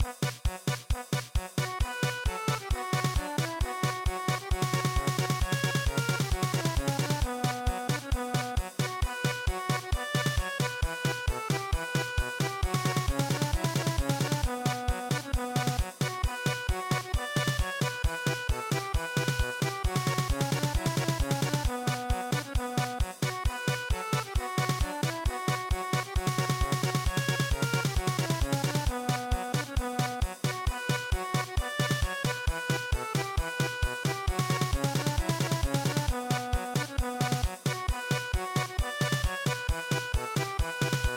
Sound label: postal carriage German Polka